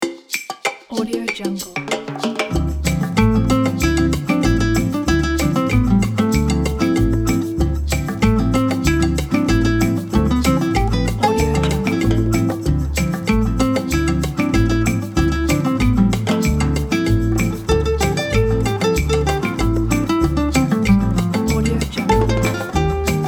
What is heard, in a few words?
lounge bossa nova; danudallmusic